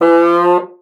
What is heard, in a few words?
MULTI, bassoon; Xsample VOL-6